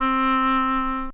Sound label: oboe; Winds